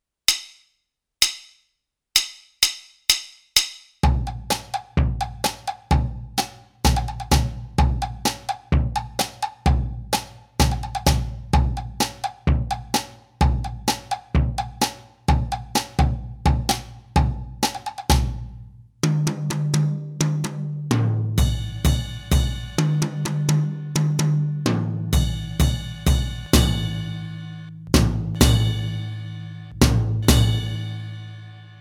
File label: Sagebrush Rag Track